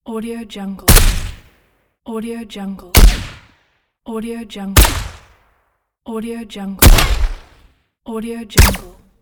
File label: gun silenced shots